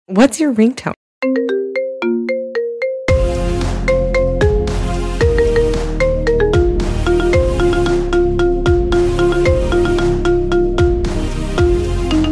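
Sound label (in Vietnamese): nhạc chuông điện thoại apple iphone 13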